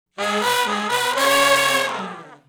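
Shuffle Blues Riff (Db) unison falloff w growl, Horncraft for R&B Sections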